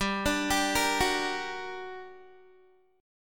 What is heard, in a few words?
G Minor Major 7th, down, Guitar; GmM7; arpeggiated